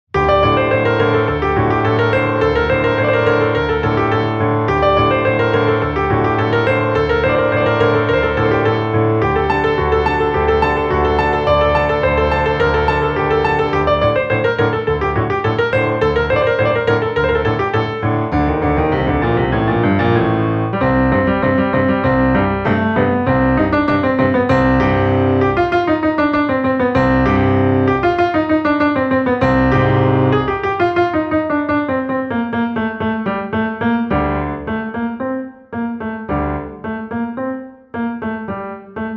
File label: For Female